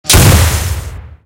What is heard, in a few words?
tank hit heat small; tanks; War Thunder